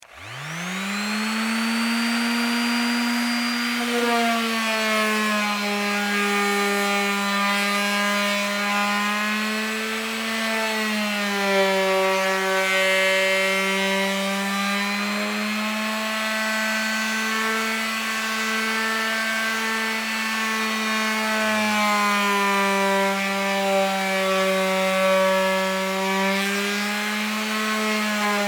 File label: Palm Sander Wood, Sand, 2X4; Industry; Machines; Sanders